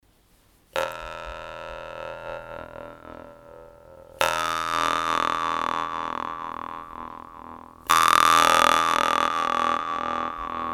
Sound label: e1 41,20 hz, harp